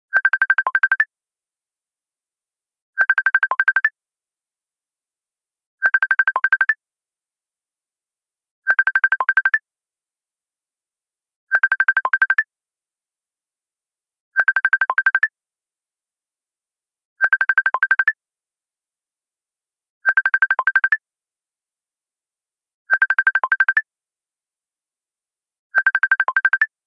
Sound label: Facebook Messenger Calling